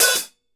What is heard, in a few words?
OPEN HAT, HI-HATS; Sampleheads; New York City Drumworks VOL-1; KD HI-HATS